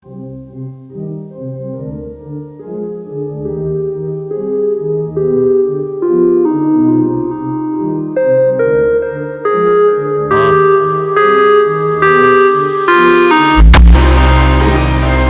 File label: brazilian phonk